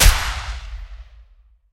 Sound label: vickers k last shot left, guns